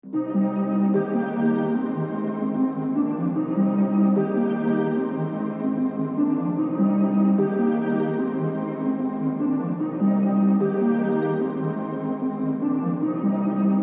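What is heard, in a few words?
C minor 149 BPM Arp Plucks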